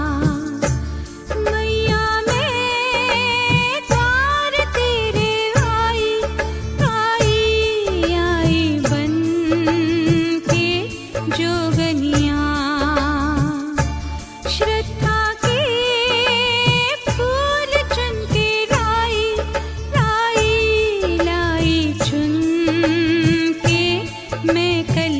kali maa bhajan

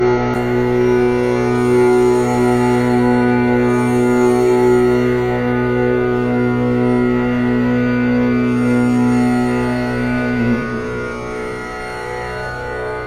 om meditation

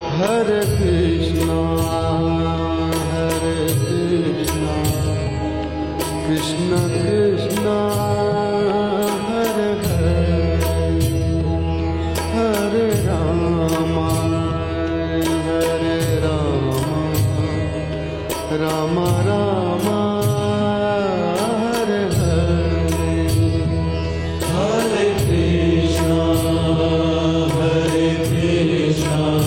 Maha Mantra

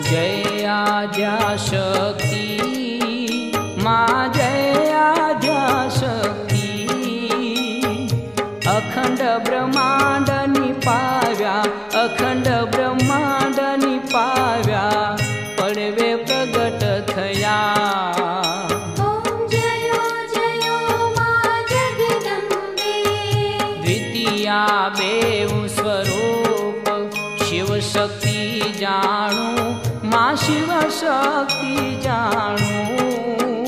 GUJARATI DEVOTIONAL

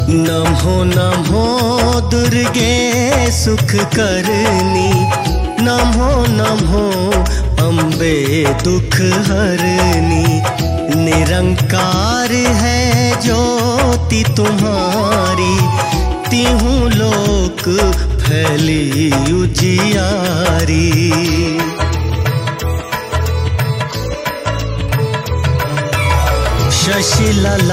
Shri Durga Chalisa

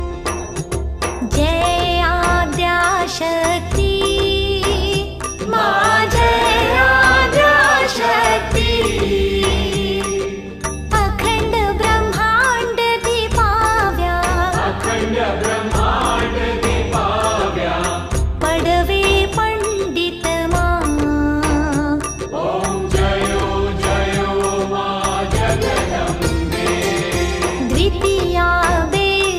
Ambe Maa Ni Aarti, Gujarati Aarti

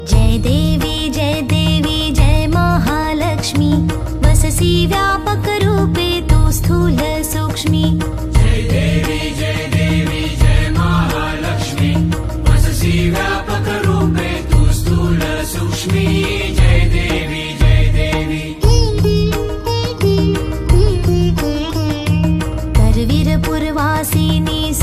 Aarti, Marathi Devotional; Mahalakshmi Aarti

